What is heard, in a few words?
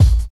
hot kick sample a sharp key, kick drum tuned to the A# note. Loudest frequency: 243Hz